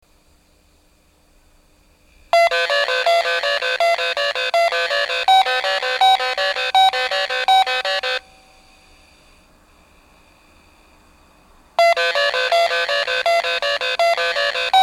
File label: shinza pass